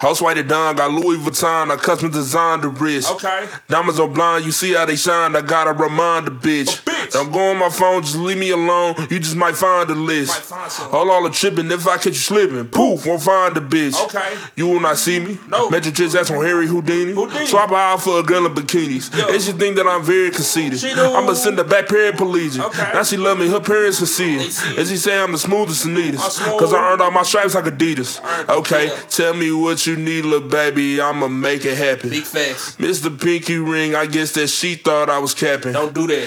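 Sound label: Acapella 132 BPM D Minor; Vocal Loops; Acapellas; Put It On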